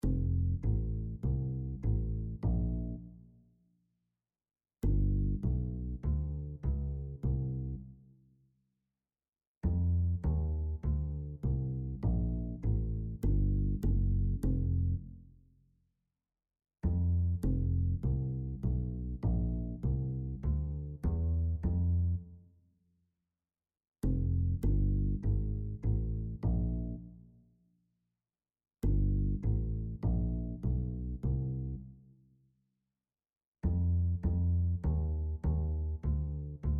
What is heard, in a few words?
Jazz Bas fourbeat, groove, jazz